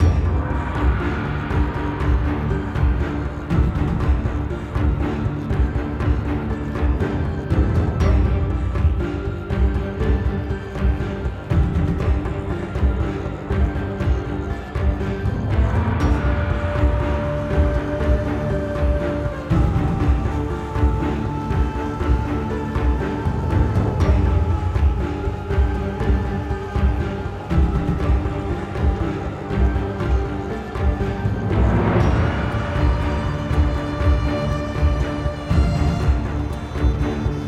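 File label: respin background; amazon-city-of-gold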